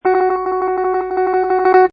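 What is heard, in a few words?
tremolo, guitar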